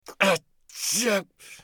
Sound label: bear wound; eft